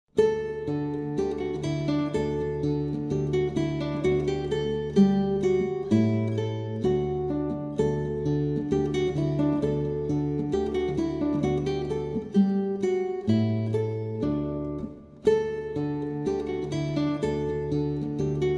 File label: Guitar Musette; Guitar Galaxy; Bach